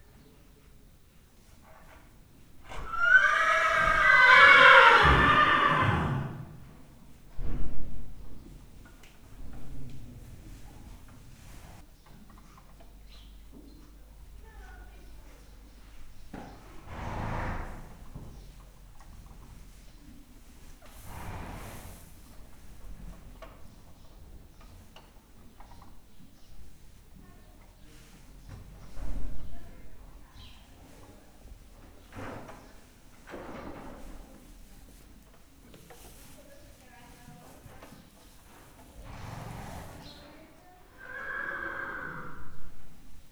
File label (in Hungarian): nyerítések fújtatások, ló; Hortobágyi Nemzeti Park 2013; máta